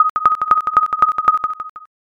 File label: railroad